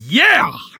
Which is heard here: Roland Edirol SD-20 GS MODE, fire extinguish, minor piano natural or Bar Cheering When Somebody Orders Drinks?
Bar Cheering When Somebody Orders Drinks